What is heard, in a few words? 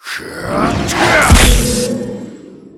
kentauros, monster